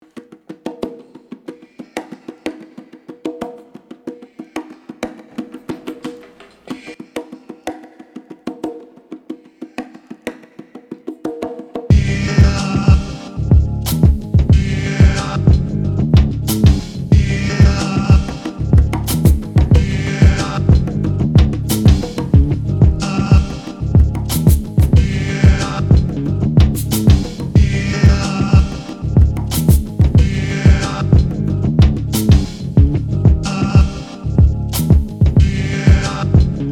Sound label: Instrumental